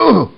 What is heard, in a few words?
hit; BattleSNDS